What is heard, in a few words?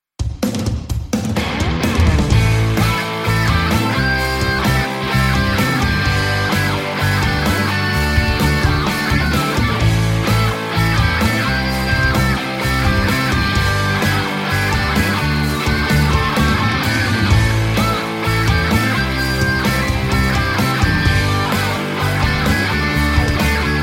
Playalong Rock